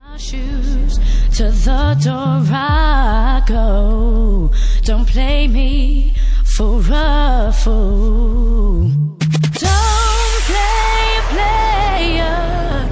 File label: Organ Remix